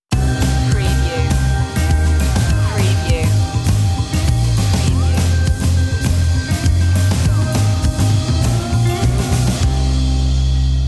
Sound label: showroom stinger